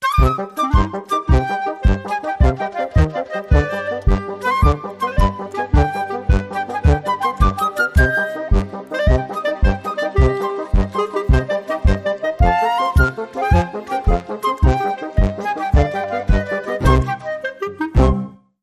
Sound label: Tarantella Jingle No Percussion